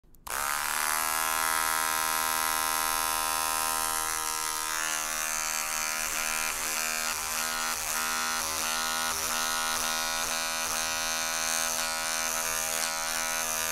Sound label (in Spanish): una maquina de cortar el pelo